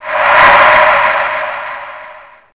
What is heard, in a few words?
zombie get up surprise